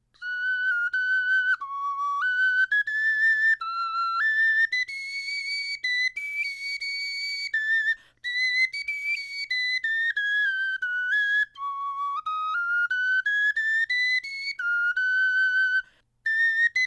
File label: melodie, knochenfloeten